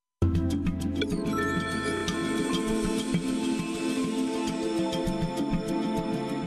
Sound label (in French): Generique Meteo